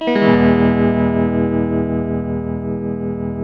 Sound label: TELTMUMAJ F, TELE CHORDS, Guitars; Spectrasonics; Hans Zimmer Guitars VOL-1